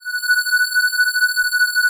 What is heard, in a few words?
ATMO PADS; Best Service; XX Large